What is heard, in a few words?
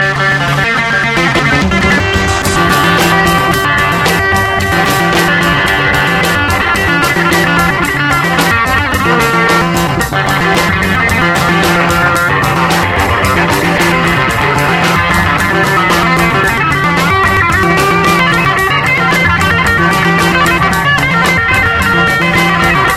solo